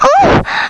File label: Moan; MacFoxes Adult Game; Girl1Lockeroom